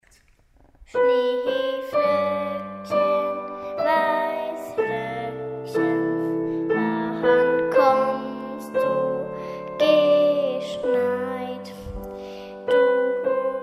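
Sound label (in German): Schneeflöckchen mit Gesang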